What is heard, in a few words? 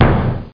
TAIKO